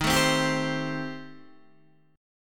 D sharp 6th Flat 5th, D sharp, down, Guitar, DADGAD